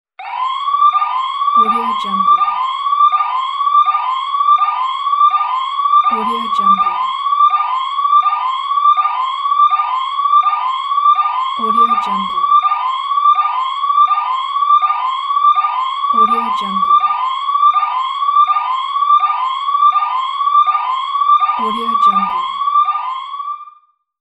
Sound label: distant siren emergency alarm